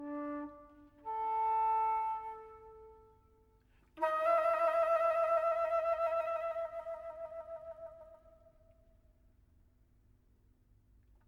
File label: Music For Flute, Strings & Percussion